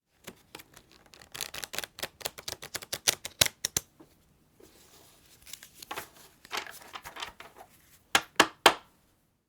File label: practice shuffling cards